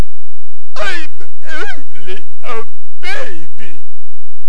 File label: pig pig